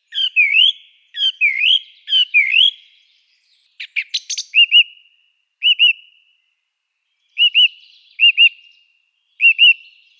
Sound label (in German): singdrossel, vogelstimmen